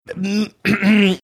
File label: clearing his throat